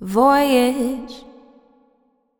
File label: Voyage, Female Vocals, Wet; Advent Calendar 2017 Day 1